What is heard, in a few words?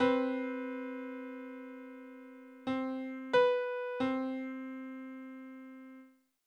Major seventh on C